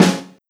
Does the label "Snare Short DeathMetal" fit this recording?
Yes